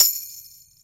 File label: Tambourene